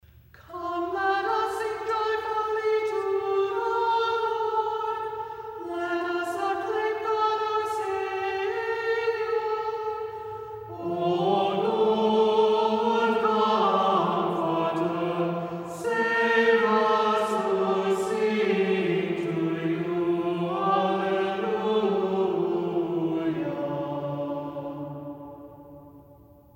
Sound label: Third Antiphon; Pentecost Postfestive